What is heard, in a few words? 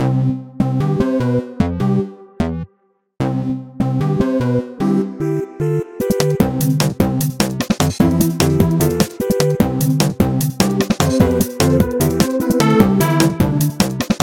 Ringtone